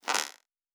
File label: Bow Draw; Survival Game Sounds - Lite Edition; Combat and Hunting